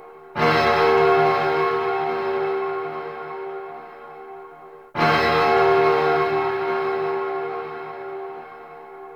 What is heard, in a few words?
Moon Spy Vault Alarm; Warframe; Orokin Moon; Ambience